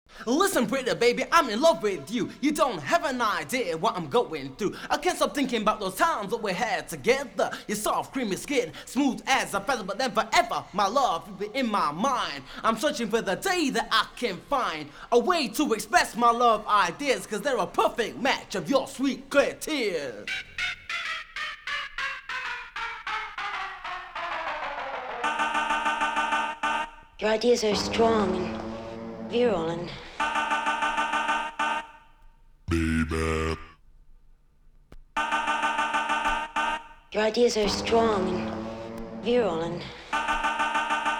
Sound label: (Acapella)